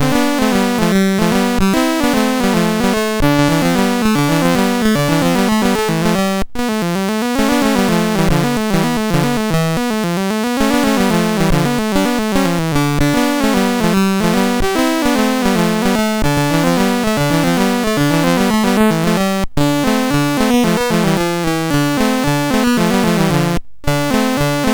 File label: Crash, MOS6581R4